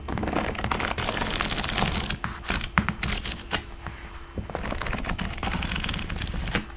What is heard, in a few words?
Flicking pages